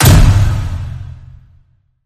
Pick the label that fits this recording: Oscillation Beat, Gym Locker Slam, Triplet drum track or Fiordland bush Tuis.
Gym Locker Slam